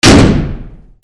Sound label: DOORS